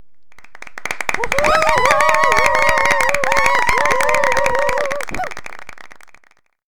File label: Cheer